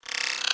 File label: Beep; Warframe; Corpus